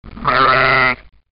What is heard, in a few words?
sheep